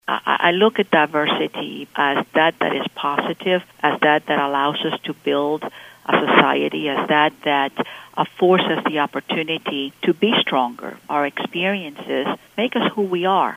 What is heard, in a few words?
flight